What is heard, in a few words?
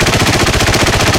ho103 loop right, guns; War Thunder